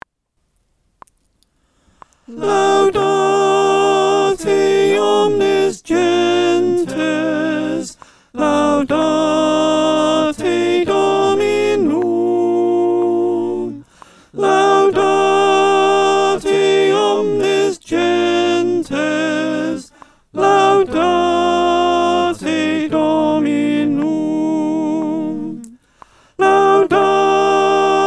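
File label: soprano, choir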